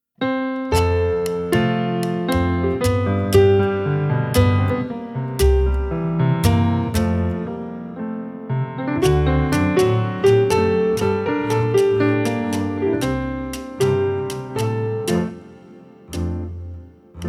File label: trio